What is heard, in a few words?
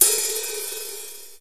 Large Room Cymbal Sound Sample D Key, Royality free cymbal sound clip tuned to the D note